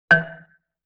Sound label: Xylophone Chord E; Vintage Cartoon; Musical Elements